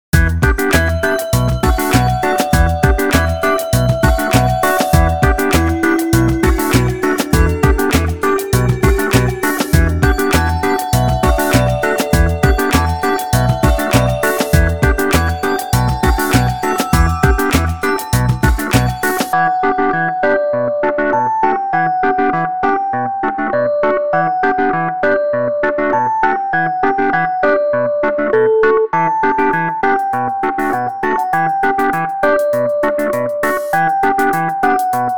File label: Bright